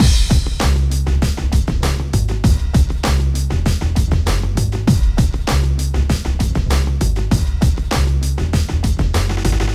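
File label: bass drums; Matt Hoffman's Pro BMX 2; XBOX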